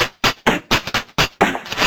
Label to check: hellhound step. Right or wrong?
Wrong